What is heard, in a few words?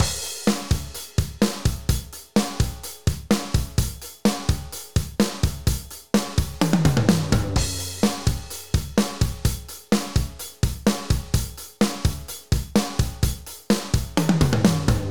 drum